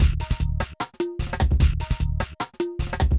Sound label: bangra 150